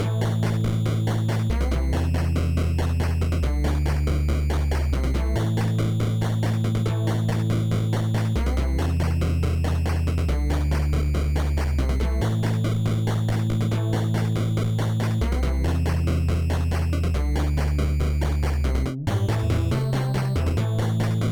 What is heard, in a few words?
Drms Synth Bass; anarship space muggler game design